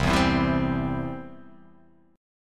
C Major; strummed; JGuitar

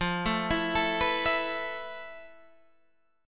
F Major 7th Flat 5th, Guitar, Half Step Down; FM7b5

